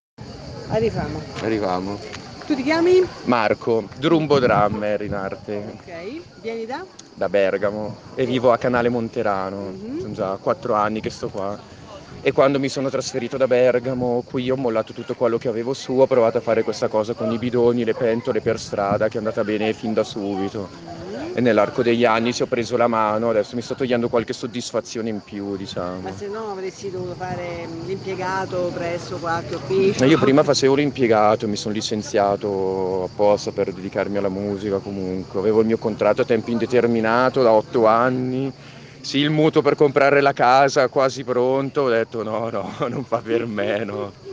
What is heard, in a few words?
drummer